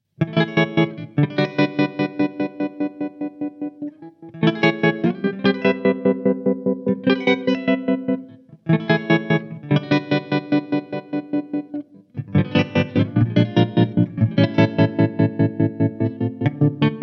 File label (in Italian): perc long